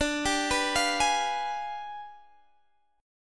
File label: G-7th Flat 9th-D-x,17,17,16,18,16, chord